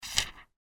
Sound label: flip End